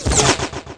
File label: zip; lomax